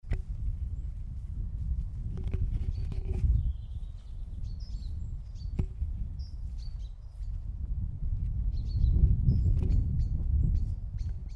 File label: tweet, bird